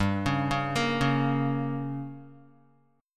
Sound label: G Diminished, Guitar; arpeggiated; JGuitar